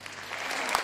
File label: clapping